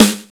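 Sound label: crummy snare; Drums In Your FACE!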